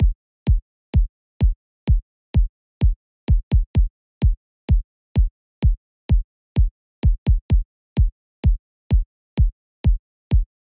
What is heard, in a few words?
128bpm c moll, drums